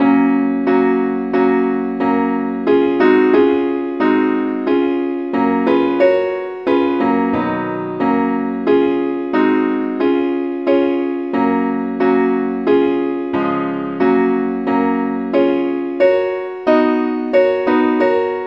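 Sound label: simple acc, key G flat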